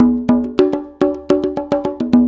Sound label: Congas, Perc Loops; Drum Tools